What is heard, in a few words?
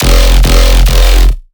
Riddim Bass Hit F Rattle; One-Shots; Bass Hits; Ghosthack; Riddim Essentials Volume 2